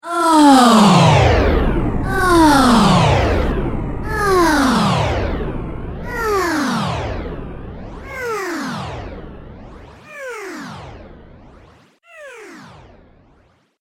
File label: Female Space Orgasm, sci fi music